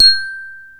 sol, carrillon; primartis; sonidos

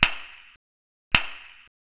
dos palmadas negras

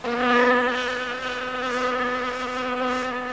abeja; primartis; sonidos

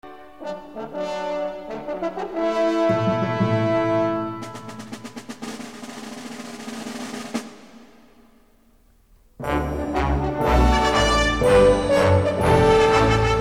fanfarria trompetas; CASTILLOS, CABALLEROS Y PRINCESAS; efectos sonido

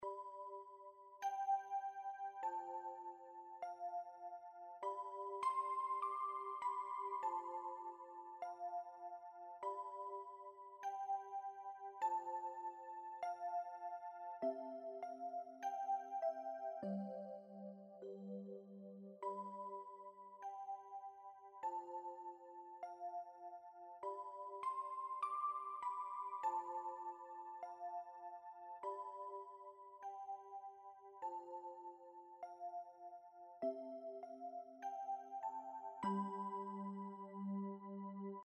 MUSICA; SALA D

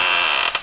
cofre abriendose; primartis; sonidos

